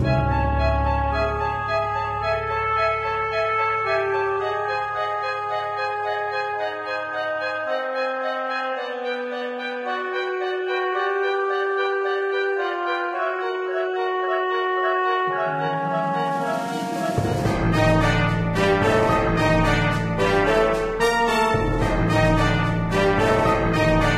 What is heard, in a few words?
Dungeon